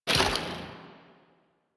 Democracy Fire Stop; Warframe; Caliber Chicks Minigame; Weapons

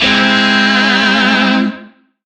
Shawzin Phrygian Chord I; Warframe; Lizzie Shawzin; Chords

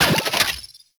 Pick Up Shield; Warframe; Caliber Chicks Minigame

